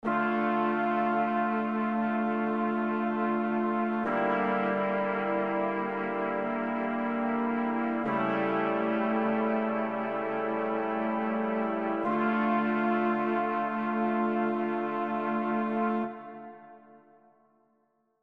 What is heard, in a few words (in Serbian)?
akordi limeni duvaci mol